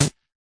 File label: tight hit, Tight n Hard Kit; Hip Hop; style kits